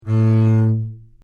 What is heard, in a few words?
double bass A1 forte arco normal